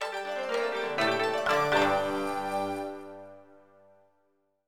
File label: Jingle, Lose Jingle; Itsudemo Dokodemo Dekiru Igo AI Igo DS; DS gamerip 2006